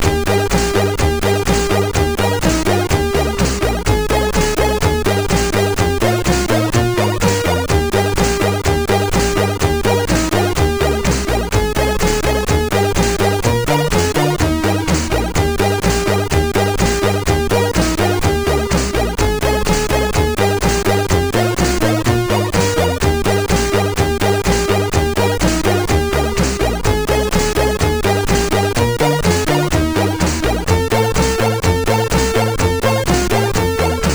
Jingle Bells sid MOS6581R4

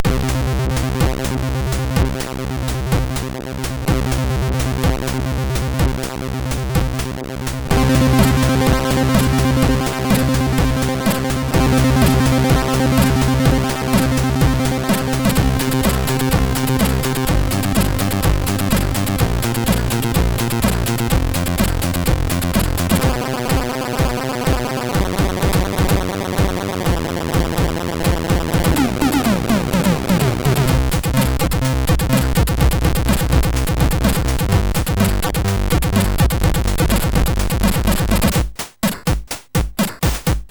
Rythmic MOS6581R3

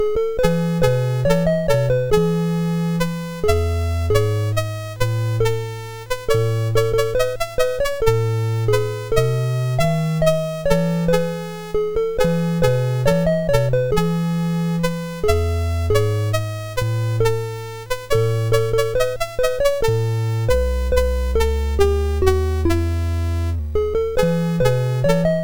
BASIC sid MOS6581R4; Singalong-a-Summer

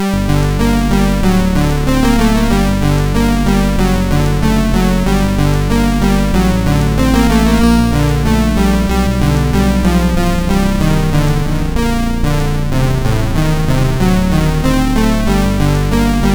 Classical, sid, MOS6581R4

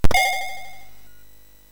SFX, MOS6581; sid; Narco Police

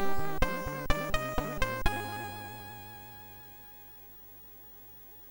sid, MOS6581R3; Atic Atac C64

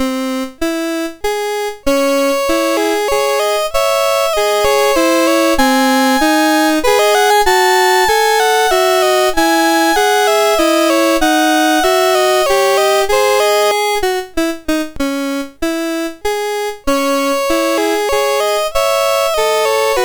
Clarinet Duet, MOS6581R3

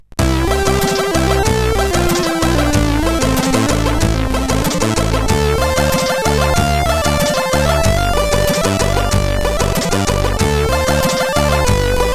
Melodious, CSG8580R5

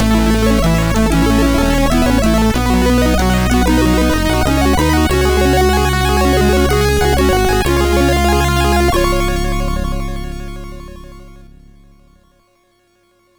Small Air tune, sid MOS6581R4

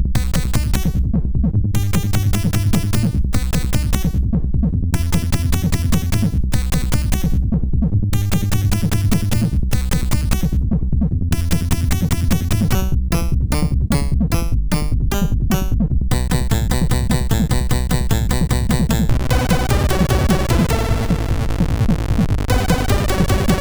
Rain sid MOS6581

